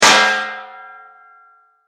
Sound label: Impact Frying Pan Hit Metal Gong; Crashes